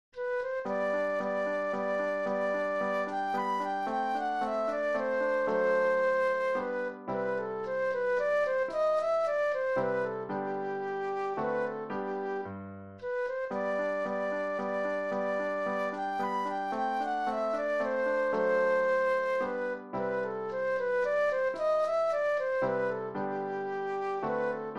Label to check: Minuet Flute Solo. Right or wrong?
Right